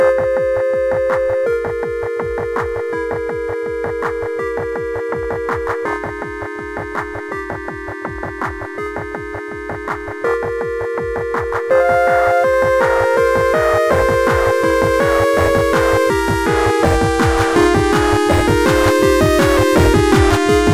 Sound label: VIOLIN IN CLUB REMIX